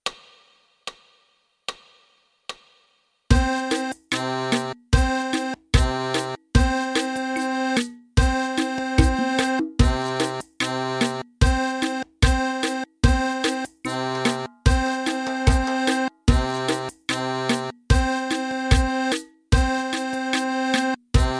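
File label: Fisarmonica, Mano Destra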